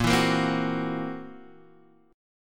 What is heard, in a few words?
C 7th Flat 5th Bb, chord